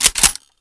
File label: m249 bolt pull, weapons